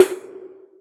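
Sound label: snare, EMU Orbit-3 drum machine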